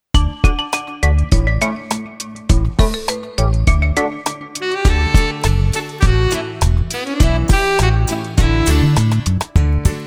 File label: KARAOKE